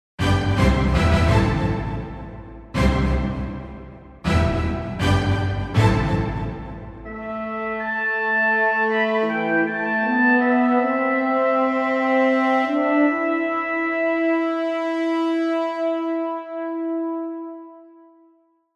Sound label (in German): Horn und Orchester Tusch; Horn Orchestra Tusch (0:22